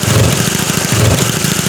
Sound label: giant pyro loop; Garry's Mod; tf2; mvm